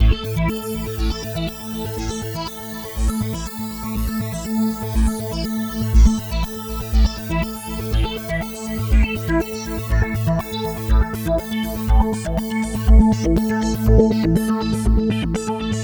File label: NRG Arp, trance